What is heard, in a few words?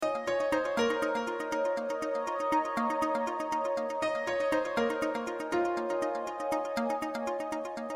ringtone